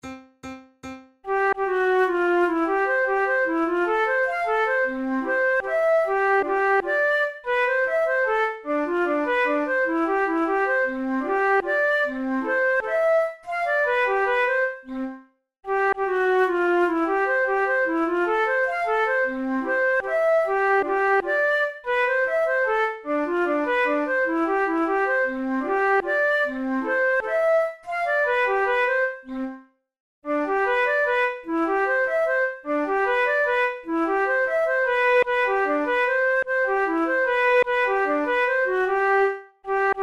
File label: mazurka, flute tunes; chopin